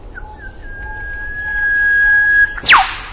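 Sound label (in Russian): протяжный звук и резкий свист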